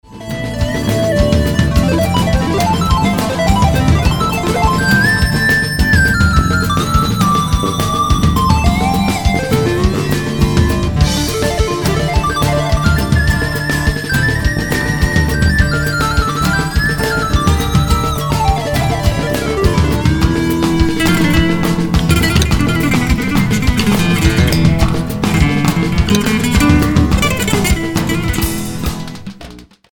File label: Samba Reggae